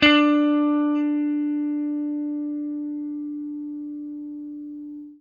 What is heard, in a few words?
FENDER HRD, Guitar & Bass; Quantum Leap